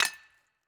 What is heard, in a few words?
mine stone